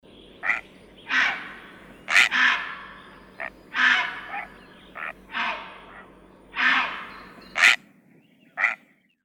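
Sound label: drops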